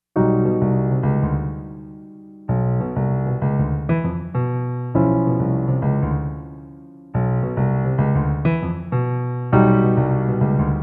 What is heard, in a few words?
funk